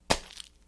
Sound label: splat; soundspvz